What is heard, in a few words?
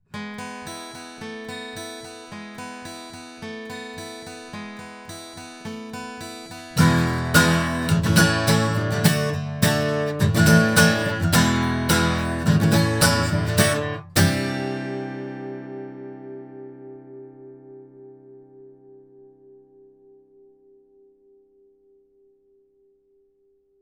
CK-1 hypercardioid guitar; Avant